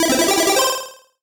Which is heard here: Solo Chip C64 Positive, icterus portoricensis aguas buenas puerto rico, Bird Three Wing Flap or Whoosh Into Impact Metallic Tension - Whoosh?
Solo Chip C64 Positive